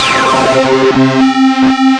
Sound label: Synthesizer Middle C Notes, Lead; SYNTH KEYS ETCETERA